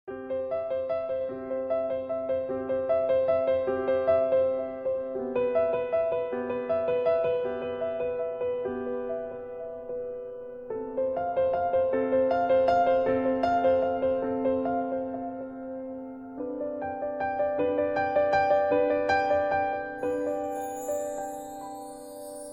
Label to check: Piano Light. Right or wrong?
Right